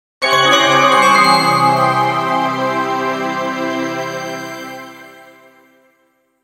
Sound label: Bells Chime Shimmer Shine Delay; Production Element Imaging Element Accent Transition